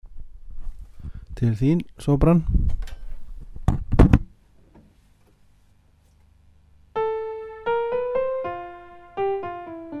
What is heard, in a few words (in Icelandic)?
sópran